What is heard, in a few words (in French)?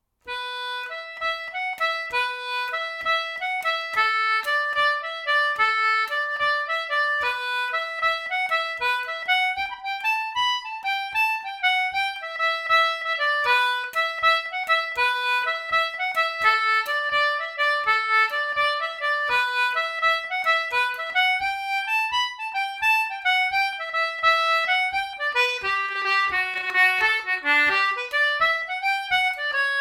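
jig, accordéon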